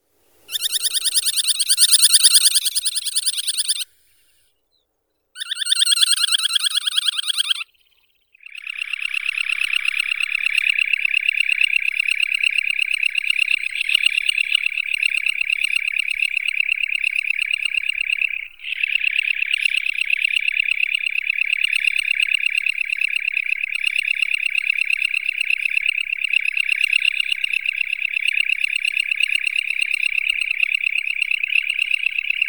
pesochnik malii calidris pusillus

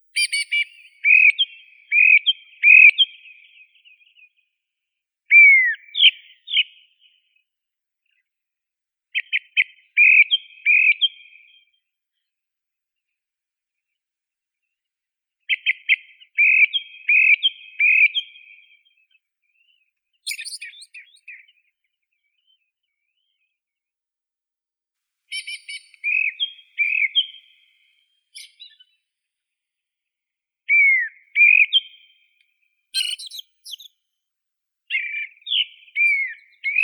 zvuki prirodi drozd burii turdus eunomus

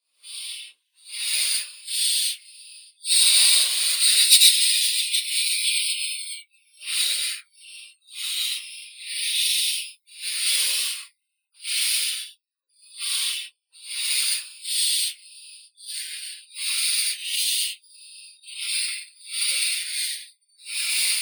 sich domovii athene noctua molodoi ptits, zvuki prirodi

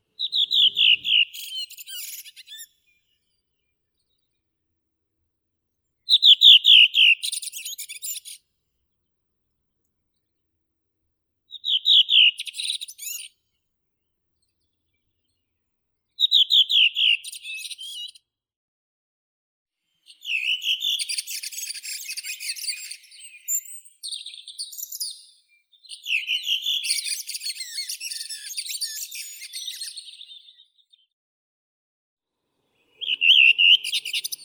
drozd belobrovik turdus iliacus

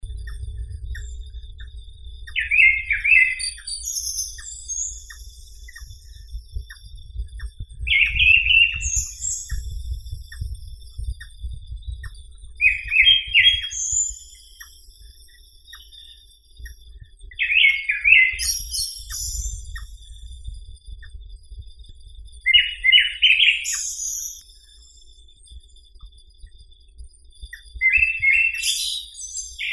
drozd belobruhii turdus cardis

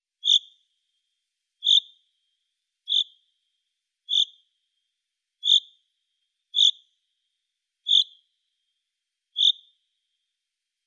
zyablik fringilla coelebs, zvuki prirodi

